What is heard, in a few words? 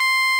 RMI EP; Sonic Reality - Vintage Timetraveler